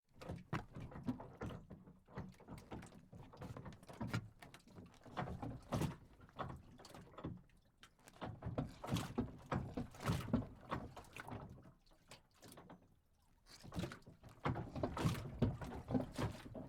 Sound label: dock movement